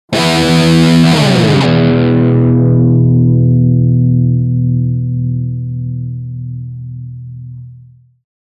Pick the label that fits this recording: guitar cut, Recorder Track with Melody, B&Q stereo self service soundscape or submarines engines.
guitar cut